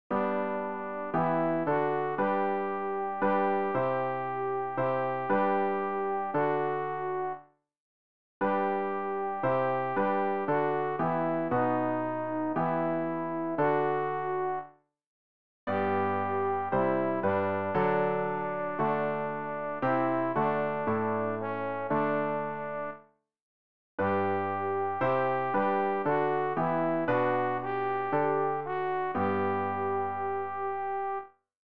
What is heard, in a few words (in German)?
alt, Übehilfen für das Erlernen von Liedern; ref gesangbuch; rg 179 gott vater du hast deinen namen